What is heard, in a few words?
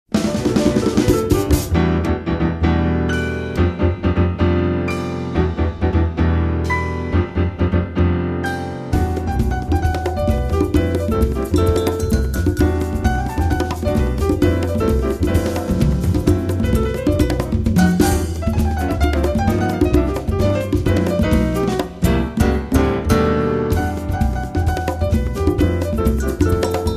Samba